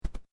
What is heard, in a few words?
Click Double Noise Processed, User interface feedback sounds; Multimedia